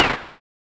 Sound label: GUN; Mistergenerator